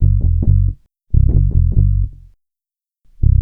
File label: Dub Bass, Bass Lines; Sonic Foundry (Sony Creative Software); Electronic Point Blank; Basses